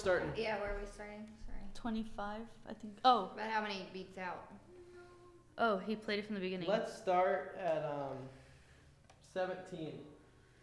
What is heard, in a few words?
violin